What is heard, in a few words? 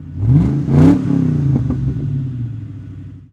Mustang Rev; SFX Kit; Automobiles